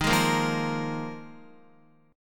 Eb Suspended 2nd Suspended 4th, Guitar; Ebsus2sus4 strummed; JGuitar

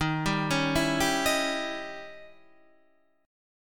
Eb 7th Flat 9th Eb, Guitar Open D; 13,10,11,10,10,13; down

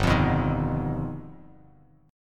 G Augmented 7th, Bass Standard; G+7 strummed; 3,8,9,8; JGuitar

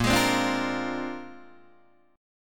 A sharp 9th A sharp, Guitar Open D

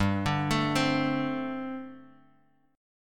G Suspended 2nd Suspended 4th, Bass Standard; Gsus2sus4 arpeggiated; JGuitar

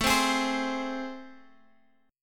A Minor Major 7th, down; AmM7; strummed

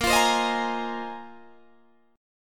A sharp 9th Suspended 4th A sharp Guitar Open G

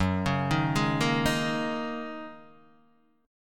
Gb 6th Add 9th Gb down Guitar Open D

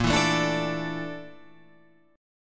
A sharp Major 7th Flat 5th, down

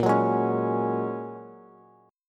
Bb Minor 7th Sharp 5th, Bass; Bbm7#5 strummed

